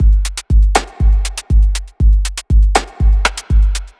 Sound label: Soft Kit A